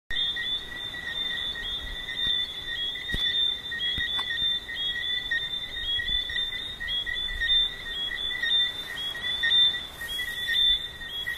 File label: Tree Frogs Jamaica